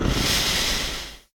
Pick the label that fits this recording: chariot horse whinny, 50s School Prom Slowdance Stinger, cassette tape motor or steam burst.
steam burst